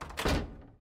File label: Mystery crate Close; Criminality